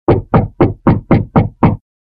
Knock On The Door

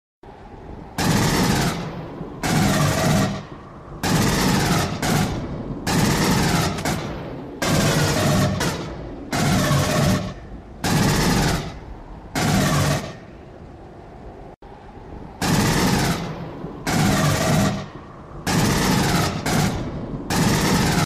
m41a pulse rifle

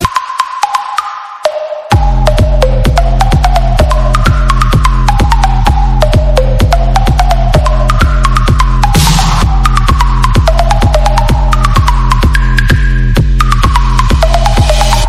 Electro; Mario Bross

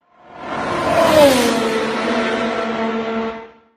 Alfa Romeo Sauber C37

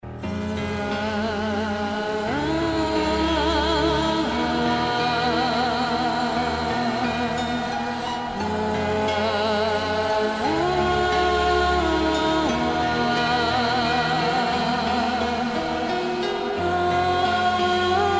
Hardstyle

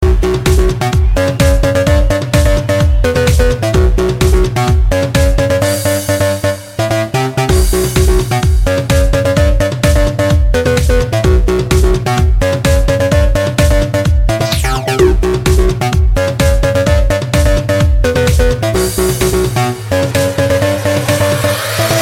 Electro

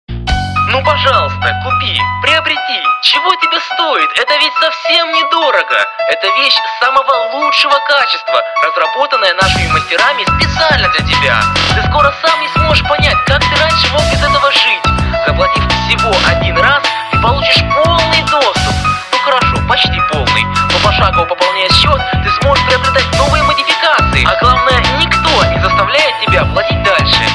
Nice Ringer

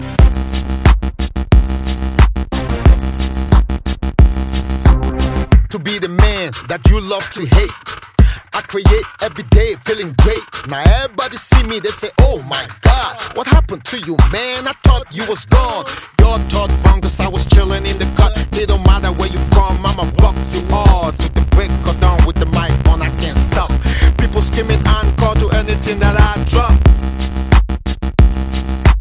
Rapping Beats

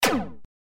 Lazzer

